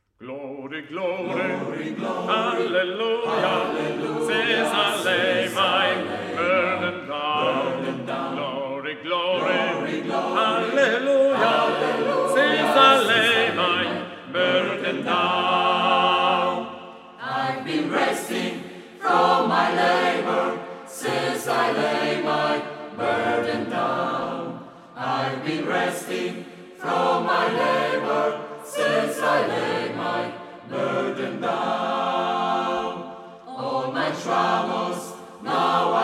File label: Chor, Glory glory hallelujah; Cantabile